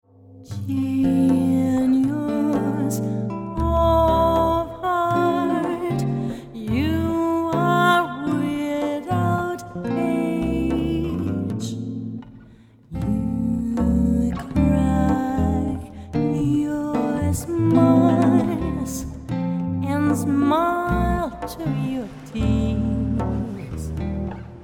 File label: Romance waltz